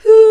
VOX WHO A4, Scats Choir; 90s Sample CDs; Club-50 - Foundations Roland; Short Scats

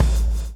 BLOW; Spectrasonics - Backbeat

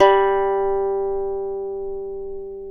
G2 DULCIMER; AKAI S6000 CD-ROM; WorldSounds

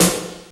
SD, DRUMS; Crypton - DrumBase5000; CD DRUMS #1

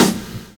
VINYL SD, Drums Dance & Groove; USB Soundscan vol.11; AKAI; VINYLKIT

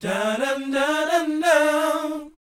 BOYZ, Vocal, R&B Dance

